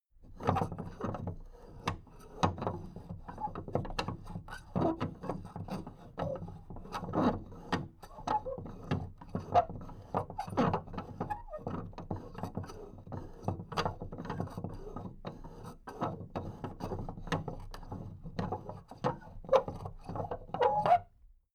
big plastic case movement handle

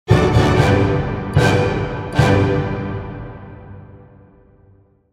orchestra fourth

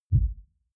sub bass

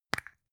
plastic spray can small open clatter short

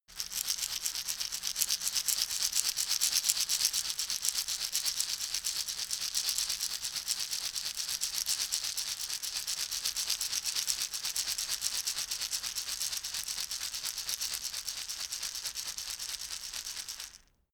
shaker wood rattle slow soft

